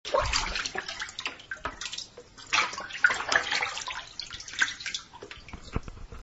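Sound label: bath; huro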